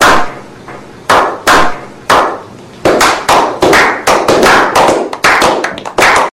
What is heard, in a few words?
slow clap